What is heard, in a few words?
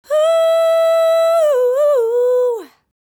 Whoo, Vocal Phrases; Ultimate Vocals